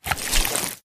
human form short move; flood

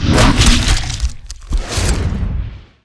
bite attack, dragon

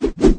airbone melee; flood; elite

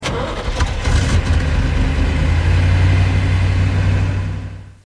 engine start, stingray; vehicles; tdmcars

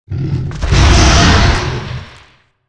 flame roar, dragon

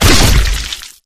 bodyfall combat form; flood

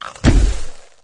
die, flood infection

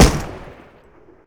bar shoot, weapons

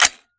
m4 bolt catch, weapons

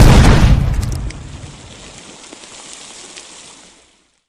pop; flood; carrier; predatorcz; halo

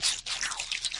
infector idle; flood

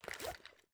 weapon deploy; weapons

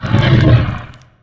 idle, dragon

